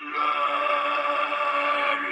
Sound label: a let loop